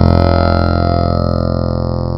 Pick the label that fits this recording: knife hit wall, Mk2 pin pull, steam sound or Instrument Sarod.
Instrument Sarod